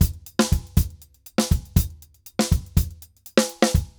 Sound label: Drums Bomba 120, 120 bpm; Drums & Percussion; Best Service; Festa Latina VOL-1